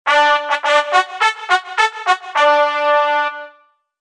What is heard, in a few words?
Ice Cream Truck Jingle; just cause 2 music to blow shit up by 2010